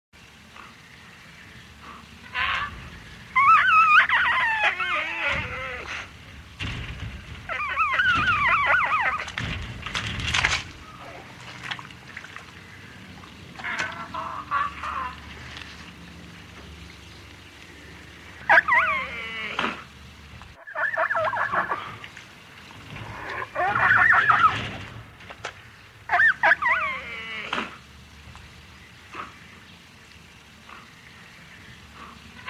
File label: animal sounds hyena